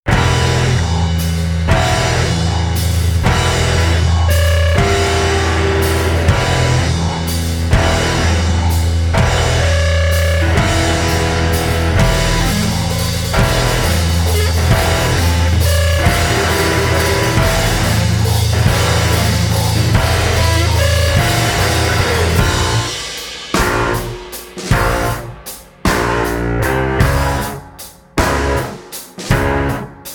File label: Instrumentals